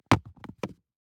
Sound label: DICE on Cardboard, Throw and Roll, Foam, 2 Two Dice; Samples; ArticulatedSounds; DICE Sfx Party (Extended)